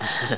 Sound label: eegh hu, speech; World of Amiga; butthead